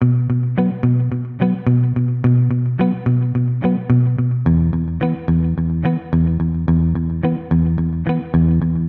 Guitar Chill Bm 108bpm; Guitar and Bass; Ableton; Factory Packs

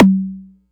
digital pitched, kick; Ableton Foundation FreePacks

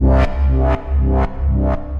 Tense Pulses; Bwauck Bwauck; Ableton; Mood Reel

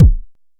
analog quick, Kickbank; Ableton Foundation FreePacks

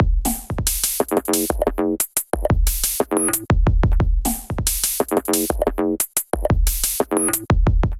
Glitch Kit; Drums; Maker Glitch Kit; Ableton; Factory Packs; Glitch and Wash

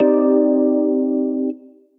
Key Chords, Synth Keys; Ableton; Factory Packs; Beat Tools

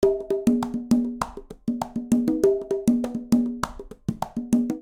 Bomba Congas 199bpm; Latin Percussion; Ableton; Factory Packs

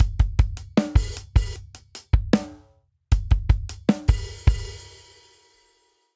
Grunge Rock Outro 77 bpm; 4 4; MIDI Clips; Ableton; Factory Packs; Drum Booth

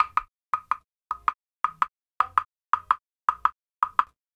110 African Wood Mini; World; African Percussion

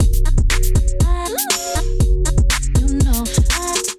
Kit, Drums; Ableton Factory Packs; Beat Tools

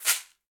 Caxixi Open; Latin Percussion; Drums; Drum Hits; Shaker; Ableton; Factory Packs

